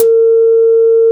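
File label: Synth Drum Wave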